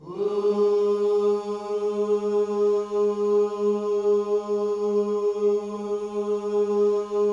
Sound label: WHOO G#3E, Vocal Planet Gospel; WHOOS; ILIO